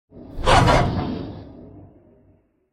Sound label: Take Items